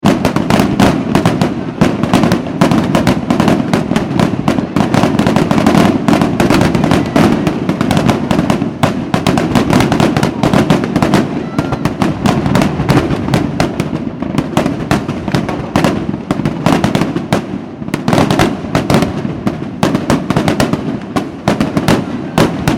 fireworks display intense; Intense Explosions At Large Commercial Fireworks Display; McKinneySound

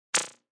Squiggle; Squiggles

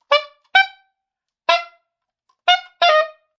Sax Squeaks Multi, Multiple saxophone squeaks; Horns (Sound Effects:Musical)

